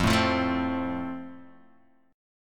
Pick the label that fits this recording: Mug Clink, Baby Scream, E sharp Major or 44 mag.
E sharp Major